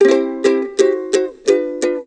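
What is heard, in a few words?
ukulele toot; Musical Instruments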